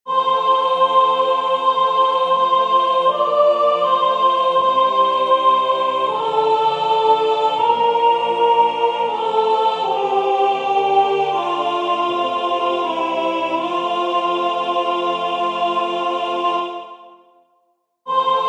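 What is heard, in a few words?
Lo How A Rose soprano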